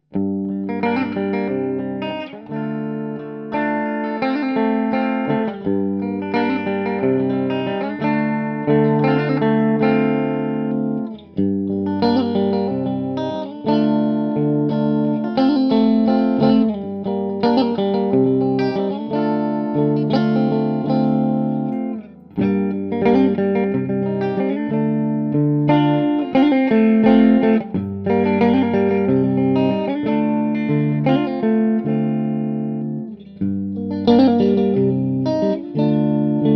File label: blues 5 positions, guitar